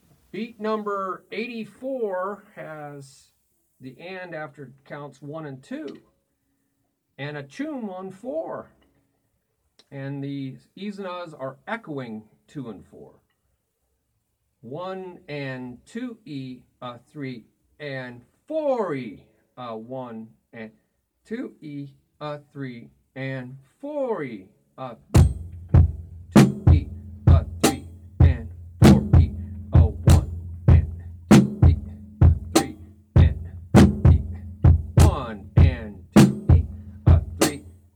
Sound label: Beat 1 2 e a 3 4 e a Toom Ba Cha Ba Ba Ti Ba Choom Ba Ba